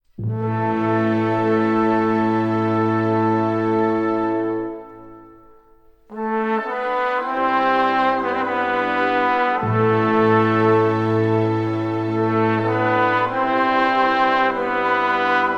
Concerto For Trombone and Orchestra on Albanian Folk Melodies